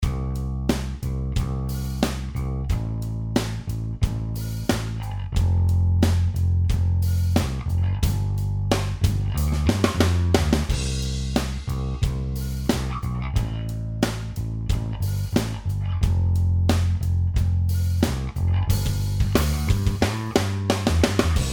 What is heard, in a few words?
rock